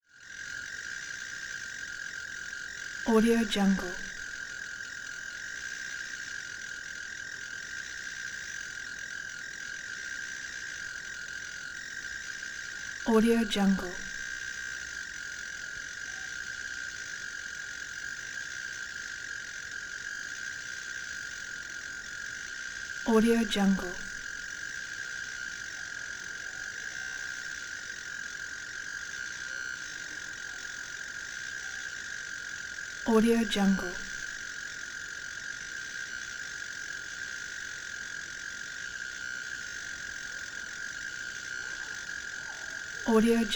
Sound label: jungle insects; prosoundfx